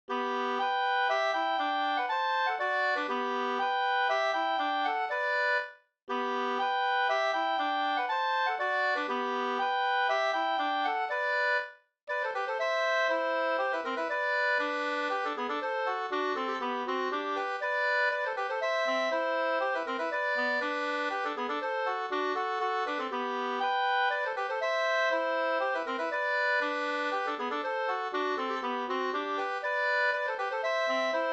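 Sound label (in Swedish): polska, tvåstämmig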